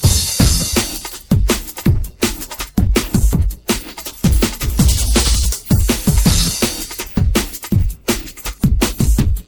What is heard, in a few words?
drum